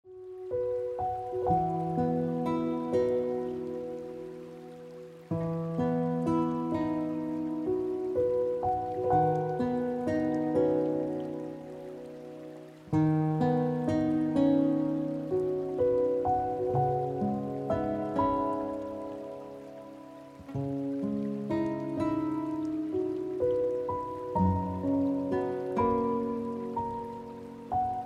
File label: Piano Music